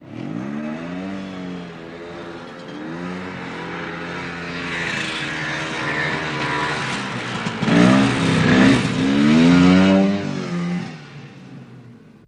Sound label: Snowmobile In By Revs